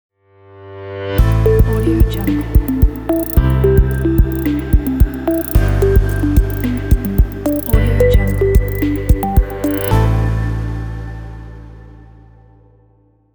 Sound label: calm logo technology